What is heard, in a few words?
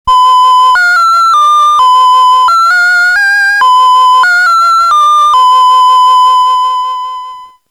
Casio VL1 Fantasy